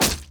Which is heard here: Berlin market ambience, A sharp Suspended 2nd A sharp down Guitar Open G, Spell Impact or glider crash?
Spell Impact